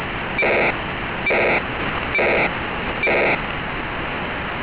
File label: fsk